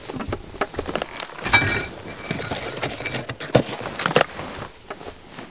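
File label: refrigerator idle; Modern Warfare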